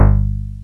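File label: square bass C1, Kawai; Rubber Chicken Software Sample Bank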